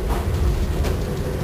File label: Point, Train; OpenBVE